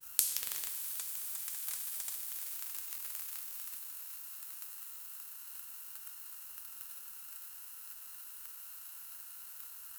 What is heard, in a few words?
realistic fine powder pou, laboratory